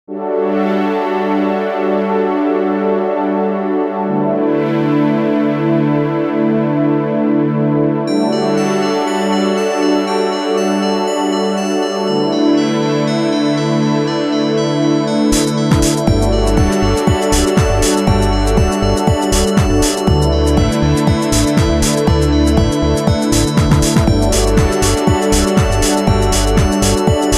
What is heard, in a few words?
synth pad